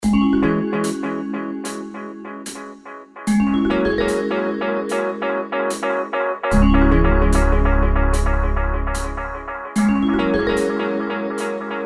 left hand reggae

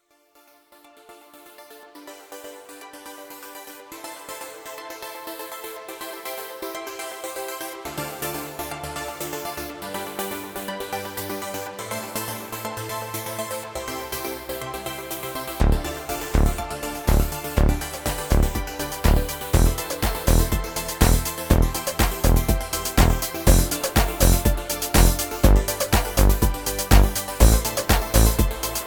dance hit 122 bpm

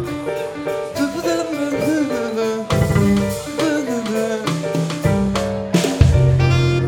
jazz bar stag night loop

